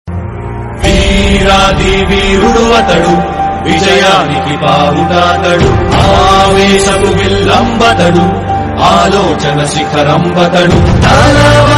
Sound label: song; kcr birthday special